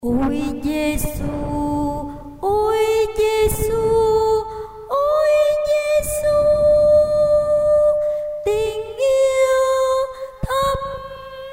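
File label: tenor high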